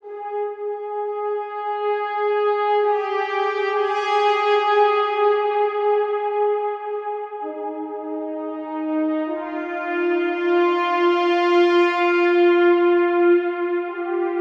Brass - Cm 65Bpm; Construction Kits; STEMS; Ghosthack; SkyRealm; Kit 02 - Solomon Hill

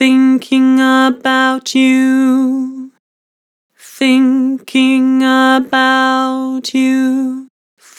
Vibrato Thinking about you, Harmor Vocals

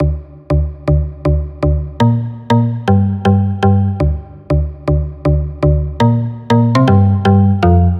Loop 120bpm G Basspluck Knocker; Ghosthack

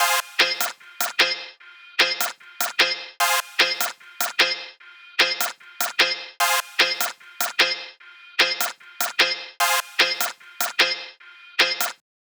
Synth Loop F, Dubstep, Chord Loops; Synths; Cymatics; Dubstep Starter Pack

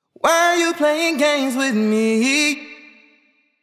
Sung Phrase Wet - 95 BPM D Min, Vocal; Cymatics; Euphoria Vocal Sample Pack

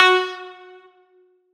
Riddim Melodic Hit - F; One-Shots; Vision; Ghosthack; Riddim Essentials Volume 2

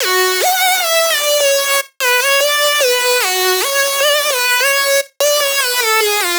Lead Loop F#, 150bpm; Dubstep; Warlock; Ghosthack; Dubstep Sound Arsenal

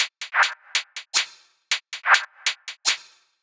Top Loop 140 BPM, psytrance; Top Loops

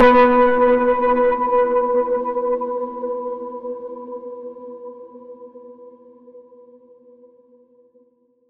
Stress

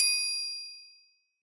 Triangle Perc; trap; Percussion; Mafia; Cymatics; Trap Starter Pack

